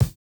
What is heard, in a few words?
kick, house